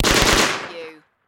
Submachine Gun MP40

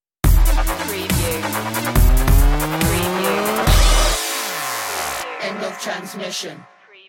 beats stinger

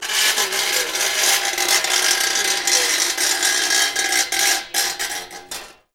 Metal Screech Intense High Pitch

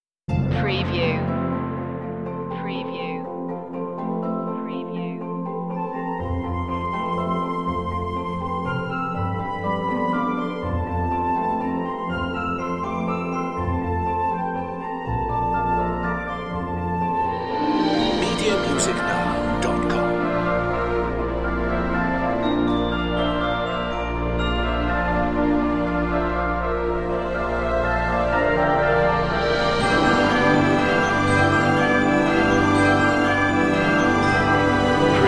a forest glade no choir

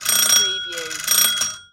Door Bell Ring

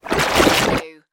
Whoosh; Sound Effects Bible

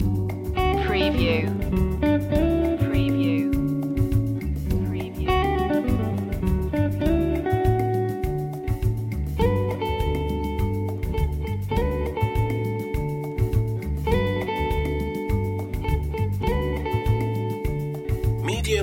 happy rhythm loop

